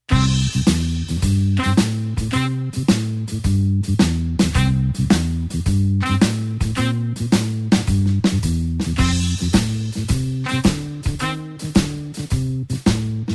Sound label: blues horns shuffle C